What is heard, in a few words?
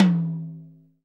TOM TOM, Real